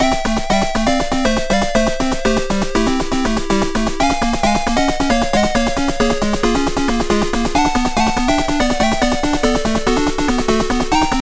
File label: Continue Screen (8-Bit Soundtrack) (Short Version)